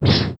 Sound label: dash; triple-aaa-games; insurrectionist